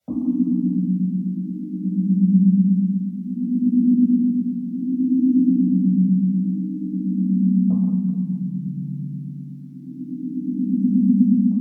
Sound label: Add synth Stereo Echo 3d